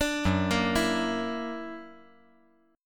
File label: F sharp Augmented 7th F sharp, chord